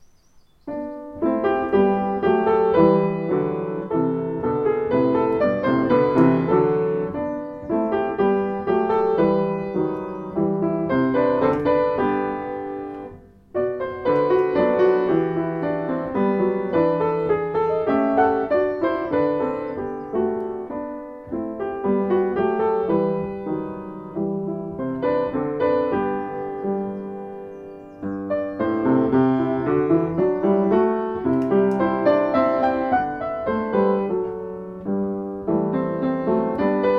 Minuet

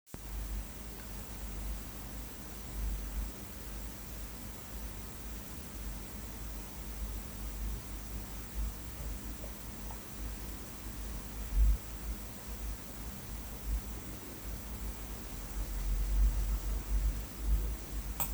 CLAP

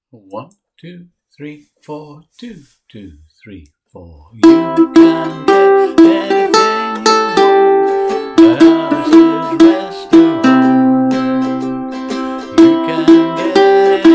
Melody Practice File

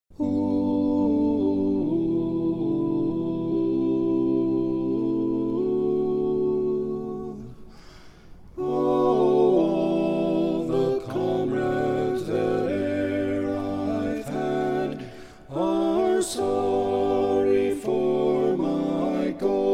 The Parting Glass (Tenor)

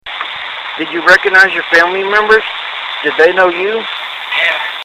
ghost box

